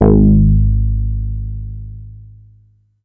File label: Synth oneshot Long Shaped Ab, Synth Bass; Slate Digital BASSHOLE Sample Pack